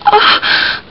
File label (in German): stoehn; Amiga Soundtracker Sample Packs